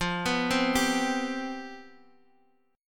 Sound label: C Major 7th Suspended 4th, F, guitar chord